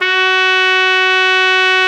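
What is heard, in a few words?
piccolo trumpet; Roland L-CDX-03 Disk 2; Picc.Tp 1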